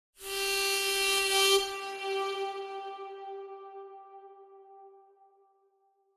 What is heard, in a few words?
Mouth Organ Steam, harmonica; woodwinds